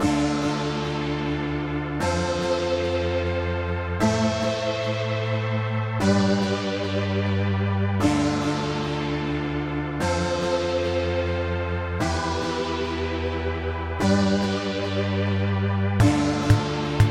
PSS460 Slap Synth FX

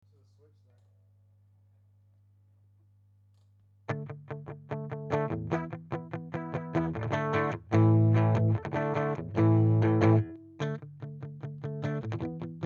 palm mute

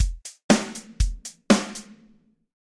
kick and snare hihats

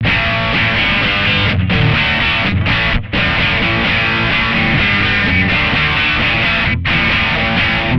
jcm800

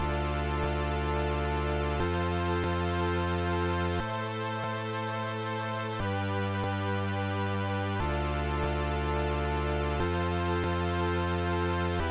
PSS460 Preset1 Dry

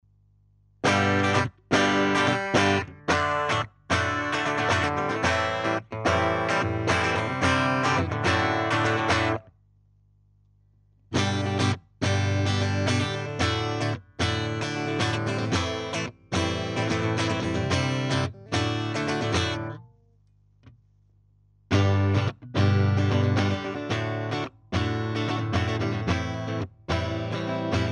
Open Chord

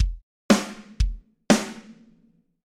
kick and snare

